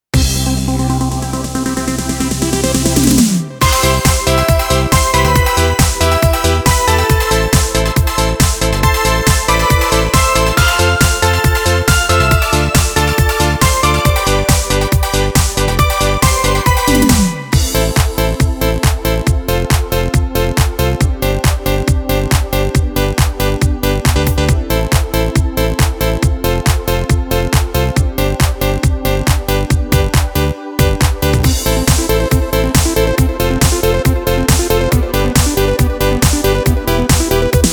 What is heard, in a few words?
Classic Disco